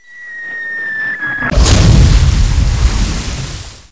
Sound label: sea mortar; overlord